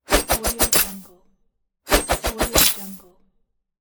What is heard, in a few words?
dagger throw on chain mail armor